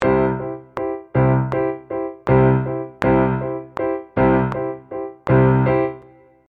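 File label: with click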